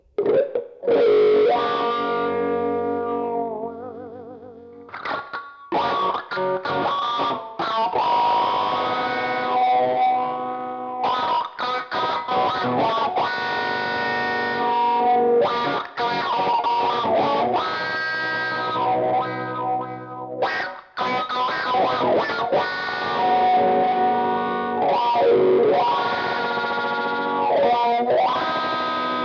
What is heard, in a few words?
electric; MegaEpix